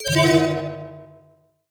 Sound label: Pickup Magic Bells; Pickups